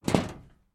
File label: ennemy hit